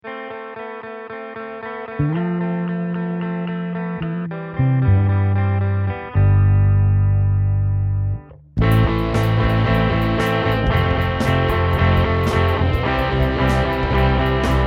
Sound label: 4\4 Rock piece